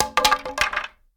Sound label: bow; kult